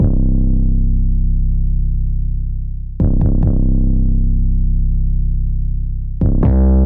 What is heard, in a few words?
808 Bass C 70 bpm; Trap Drums; Diverge; Trap Drums by Sound Oracle; Ableton; Factory Packs